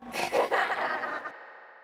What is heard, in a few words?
girl laughing; garrysmod